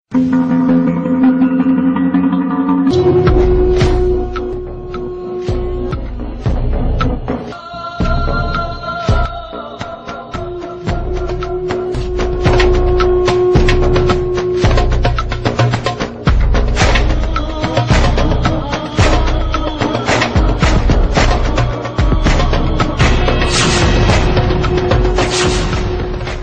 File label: bg music